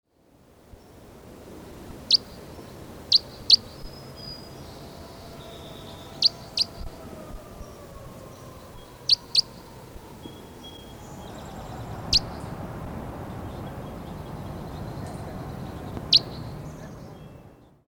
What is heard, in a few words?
Red Crossbill Type 4